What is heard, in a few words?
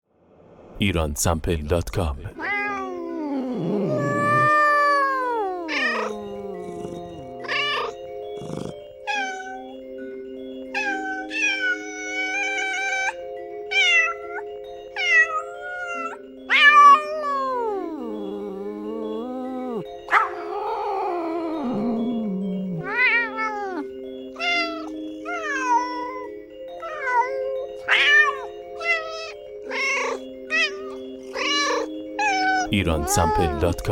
Cat; Iransample